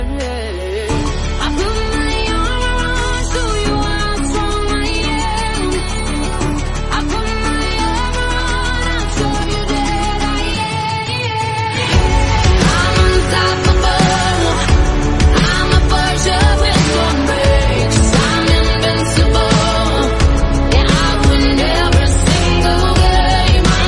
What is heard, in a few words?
Song - English Ringtone